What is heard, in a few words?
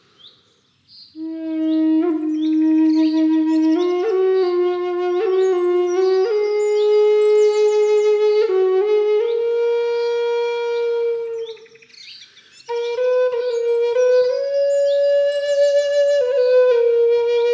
Lakota key E aeolian, flute; drone aeolian flute in key of e bass mi